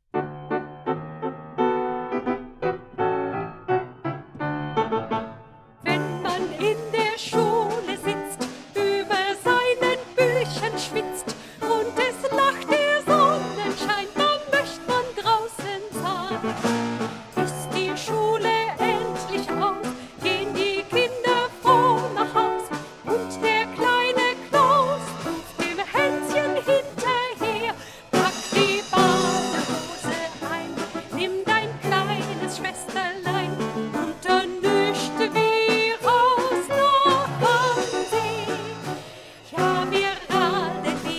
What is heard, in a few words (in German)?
komplett mit Gesang langsam